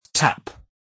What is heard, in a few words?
tap